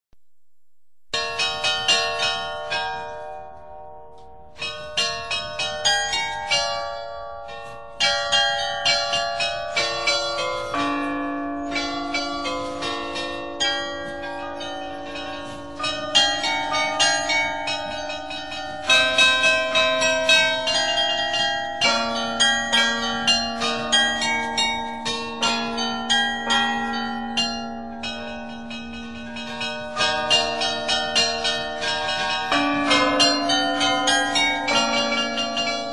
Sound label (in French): enfant, carillon; carillon stjean